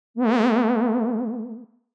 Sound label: funny sound effect for jack in the box, surprise